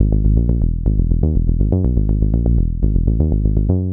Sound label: Bass Synth Bmaj Gmin Tonic G, 122 BPM; Pro Bass Synth Studio Kit; MMB